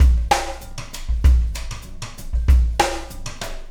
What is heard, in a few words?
Dip 25 Beat, Hammond